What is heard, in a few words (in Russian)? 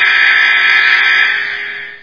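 жужжание сирены предупреждающей об опасности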